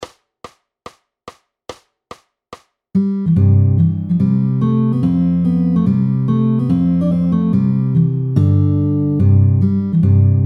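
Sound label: guitar, Irish; The Irish Rover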